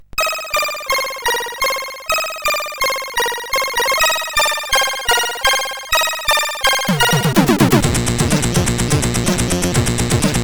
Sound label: Intro Music II, CSG8580R5